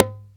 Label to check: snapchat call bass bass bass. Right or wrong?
Wrong